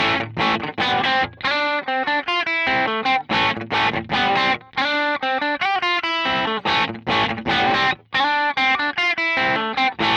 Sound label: crunch FX; interface test; KOMPLETE AUDIO 1